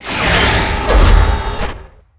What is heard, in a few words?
rearm anim; tinker